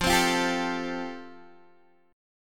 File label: F Major Add 9th, Guitar DADGAD